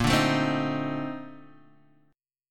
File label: A sharp Major 11th A sharp Guitar Half Step Down